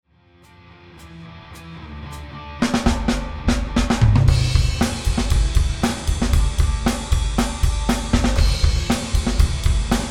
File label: drums